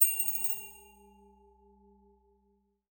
Transition Bell Chime; Production Element Title Transition

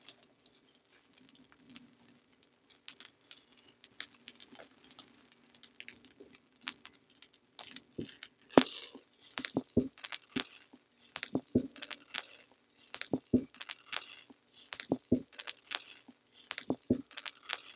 needle end, Vinyl; Cineblur

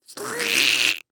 Cartoon Weird Noise Short Choking; AdobeCC

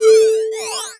Beep Evolver

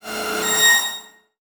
Title Transition Crystal Glow; Adobe; Production Element

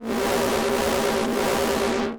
Sci-Fi Electronic Energy Distortion; Science Fiction

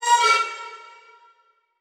Transition Hit Impact Electronic; Adobe; Production Element

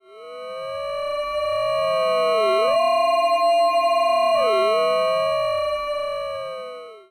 Sci-Fi Electronic Alien Scanner Ascending; Science Fiction

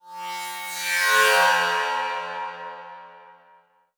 Title Transition Laser; Production Element

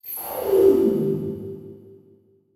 Transition Whoosh Fly By; Production Element; Title Transition; AdobeCC

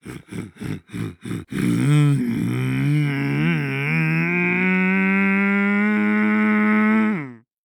Human Male Voice Motorcycle Sounds

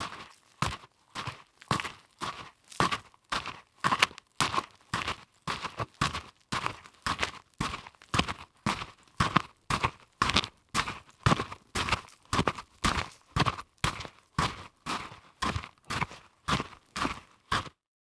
Foley Footstep High Heels Dirt Debris Walking; Foley Footsteps

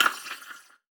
Multimedia Internet CD-Rom Flash Wipe

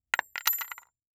Impact Coins Multiple Coins Drop On Board

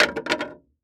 Impact Wood Board Drop On Boardwalk

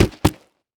Foley Footstep Work Boots Dirt Debris Stumble; Foley Footsteps

